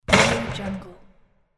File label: basketball rim shot